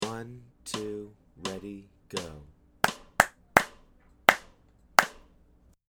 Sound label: rhythm practice